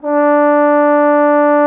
TROMBONE D4; Xsample VOL-3

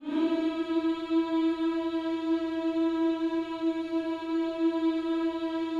HMMS E 4E, gospel; Spectrasonics; Vocal Planet

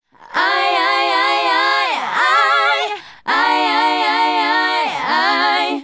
Female Group, Hip-Hop and RnB Vocals; Sonic Foundry (Sony Creative Software)

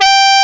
BRASS G4; AKAI S6000 CD-ROM - Volume 1; BrassWind

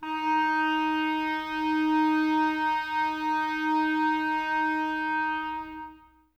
Clarinets, Wood Winds

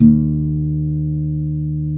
E 2 MARTIN 6, Guitars; Spectrasonics; Hans Zimmer Guitars VOL-1

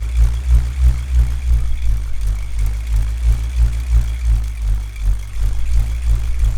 BULLROARER, Primitive Instruments

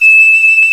fife, British fife; Roland L-CDX-03 Disk 1

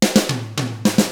POP, Drums; ILIO; Double Platinum Drums 1

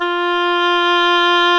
SOLO WINDS

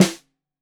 Snare 65 Reg Stereo, Deeply Sampled Drums

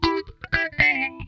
Funk Guitar; Zero G - Funk Guitar

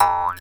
Jaw Harp; musicradar; cartoon caper

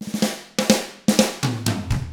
127 Drums

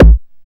BD ANALOG; Sound & Vision - Gigapack II CD 2

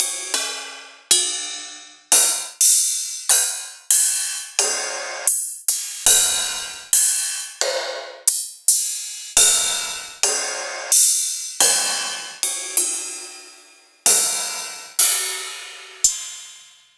Rides; Electronisounds

